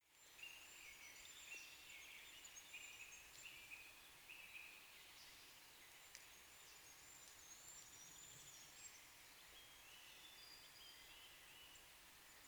forest daytime

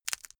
crack knuckles

